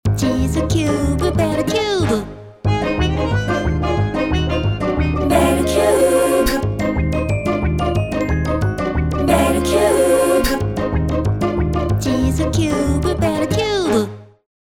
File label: bell cube